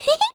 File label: ump9 jump